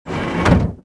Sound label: door small; movers